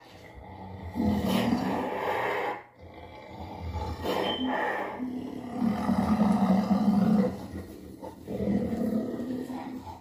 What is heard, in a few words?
Rhinoceros bellows